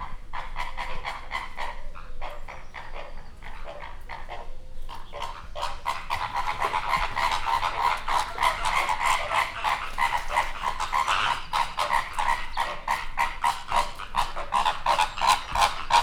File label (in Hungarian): kozbeszed, rozsas flamingo; Győri Zoo 2014